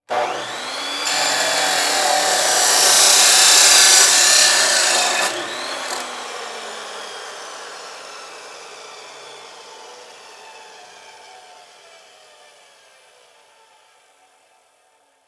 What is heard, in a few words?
Industry Tool Run Chop Saw